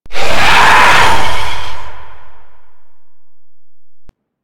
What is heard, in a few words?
Golem Grenade Head Attack; Warframe